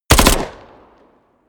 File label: smg uzi 45 heavy burst